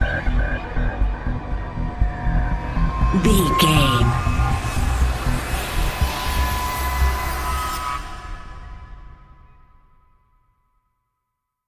foreboding and warning stinger, Tension, ominous, dark, suspense